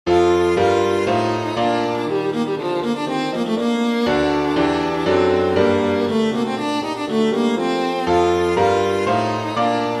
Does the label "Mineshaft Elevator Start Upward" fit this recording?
No